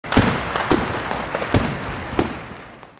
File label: fireworks; Clover Party